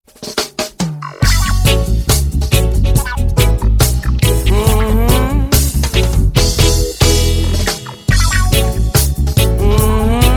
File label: Bass & Real